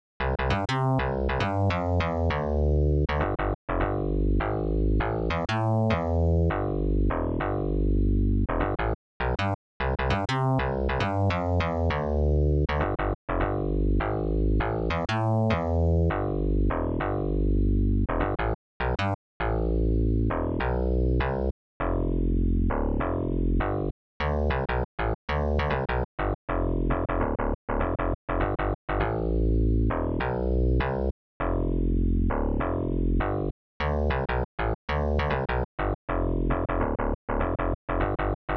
bass; homophobic (everythingphobic)

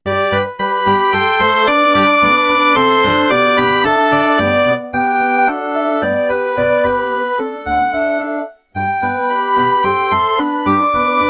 arcade; Beethoven

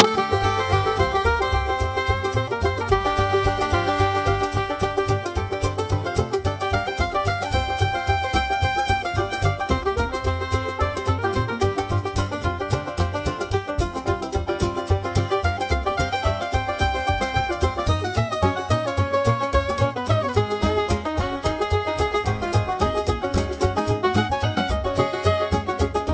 Modal Jazz banjo

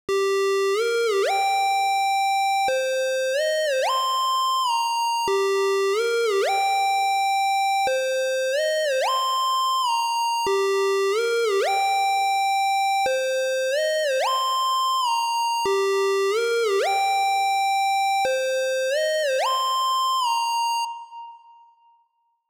carry lead

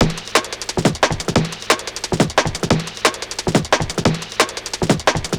BREAK 178; minecraft

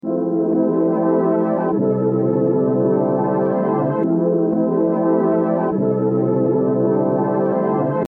Pad house chord; boss

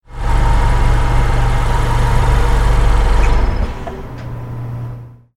car stop; momi gets ran over

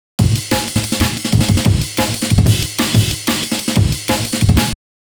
OTHER DRUM BREAK

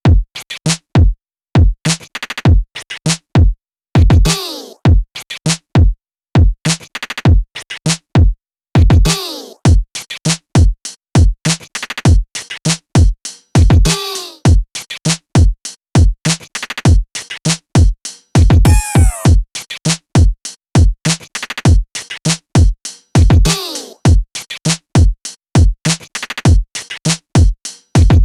100 bpm drums; FAUCET

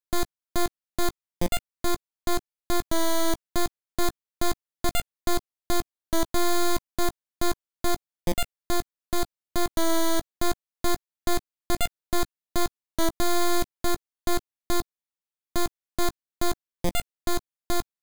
pulse

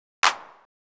hand clap

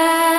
Acapella - Vocals Only; Loop

